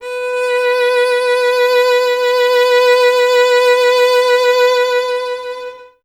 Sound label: violin; Miroslav Vitous - Solo Instruments VOL-2